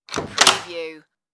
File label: light room door open